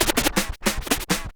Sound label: SCRATCHES; Scratch 1